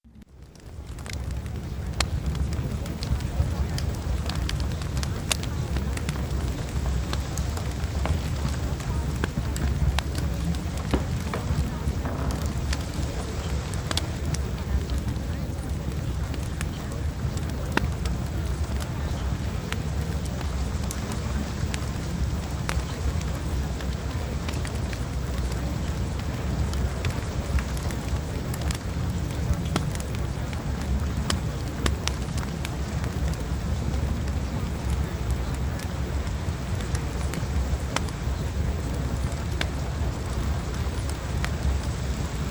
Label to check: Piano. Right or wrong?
Wrong